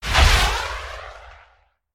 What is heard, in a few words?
rocket